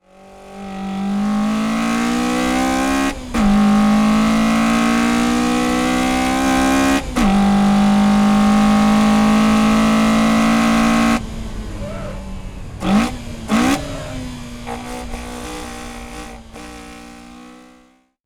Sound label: Boost Sound